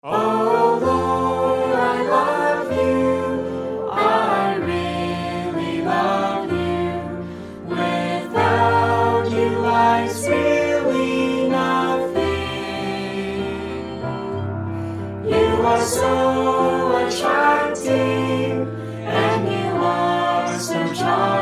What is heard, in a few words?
harmony, hymns; New Songs